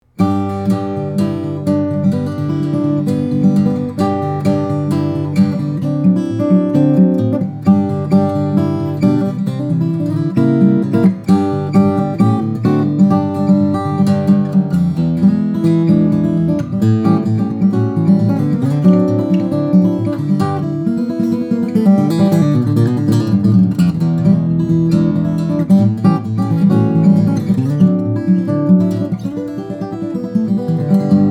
martin 00 custom shop, steel string guitar flattop